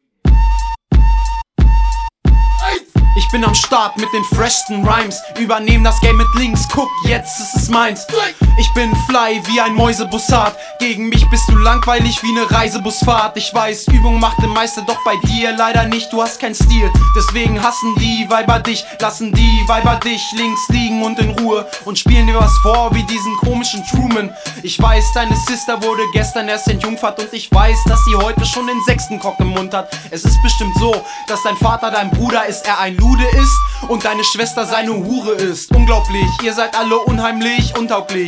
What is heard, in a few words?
Indian Flute Rmx